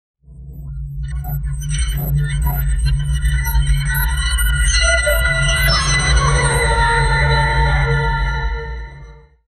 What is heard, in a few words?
dramatic horror build up, low growl